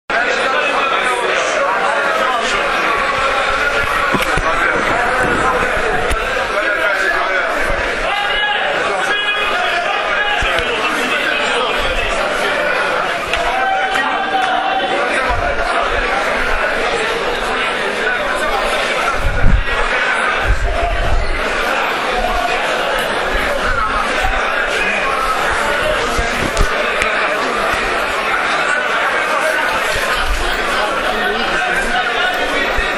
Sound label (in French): Ambiance poissons, Monastir; TUNISIE 2018